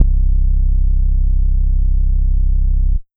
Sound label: Synth Bass Sub; One Shots; Instrument